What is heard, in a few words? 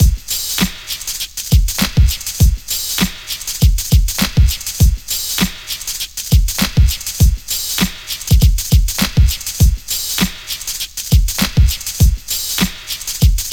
100 Perky Stereo Splash, Breaks; Lockdown Breaks